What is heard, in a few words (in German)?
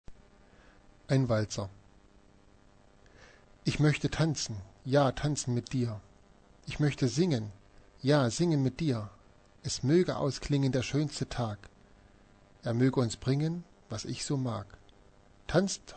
walzer